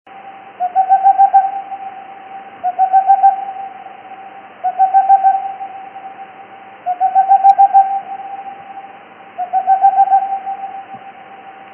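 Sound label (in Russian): sych mokhnonogijj, boreal owl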